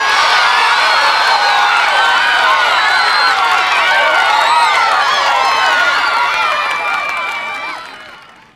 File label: ambience, cheer; birthday party bash; wii